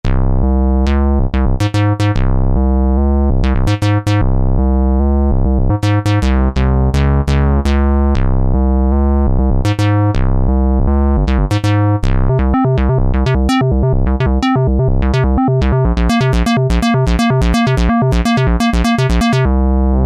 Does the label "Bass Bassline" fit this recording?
Yes